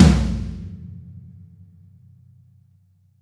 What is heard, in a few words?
Tom Floor; Percussion Samples; zReal Kit